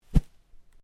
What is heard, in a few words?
Swish, Foley sounds for melee weapons; Combat Swing Sound Effects; Combat Swings (Sound Effects:Combat)